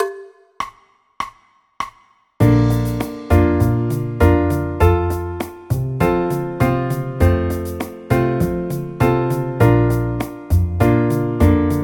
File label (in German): bb dur akkordverbindungen